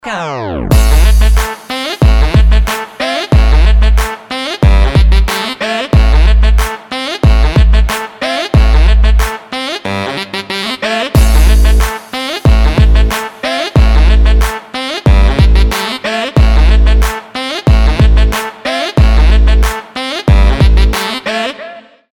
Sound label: trap saxophone